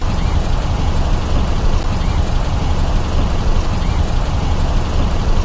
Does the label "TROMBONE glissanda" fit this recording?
No